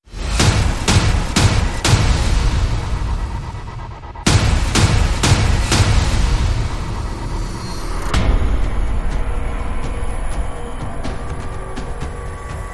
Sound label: Instrumental No Vocals No Bass No Guitar